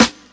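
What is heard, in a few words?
classic hip hop Snare; Classic Hip Hop Mega Kit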